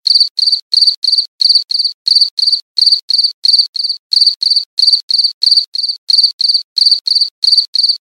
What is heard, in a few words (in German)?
Grillen